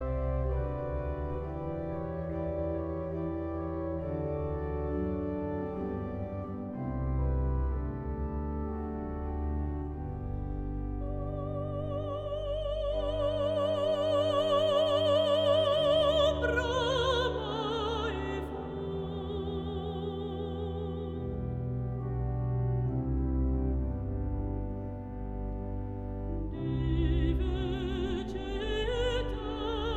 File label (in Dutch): Koor (mannen), met soliste; Mastreechter Staar; Largo